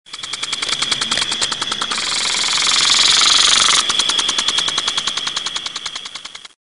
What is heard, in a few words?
Water Sprinkler